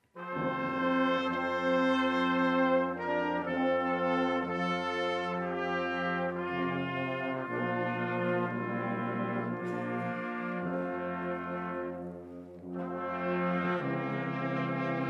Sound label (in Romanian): Fanfara; Joi - Nasterea Domnului